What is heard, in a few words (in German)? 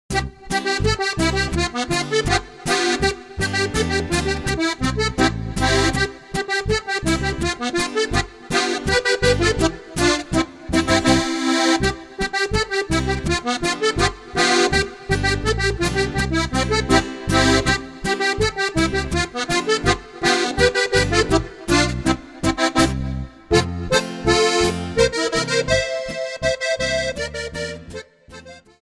gasthaus boarischer